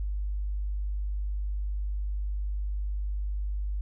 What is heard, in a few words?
Haptic Battery